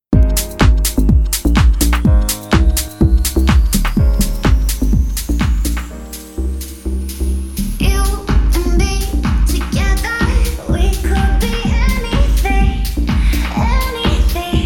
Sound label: music Together 8D